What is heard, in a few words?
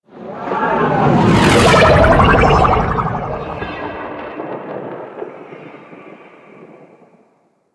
Insect Liset Fly By Slow; Liset Flight